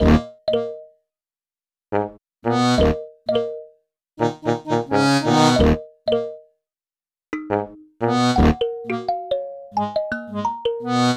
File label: Kalimba Accordion Combo, Cinematic Funk